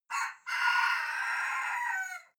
cock call hoarse distant

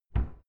cupboard close fast subdued

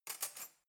cutlery knife drawer place soft

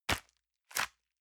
envelope grab release hard

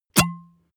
glass bottle drink stop hard

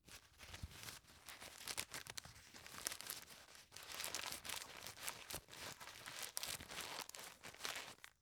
tissue stuff in package

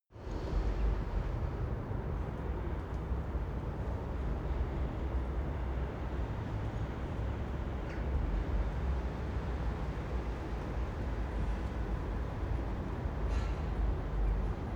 square industrial traffic distant

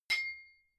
hammer hit ring high

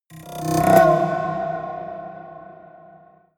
haunted strings roll high

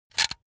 razor retract comb hard

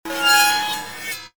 metallic scream

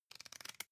magazine browse

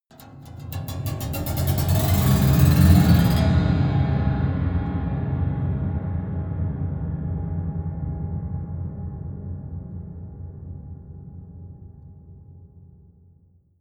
piano twang accelerate low

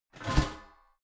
garbage can close lid hard

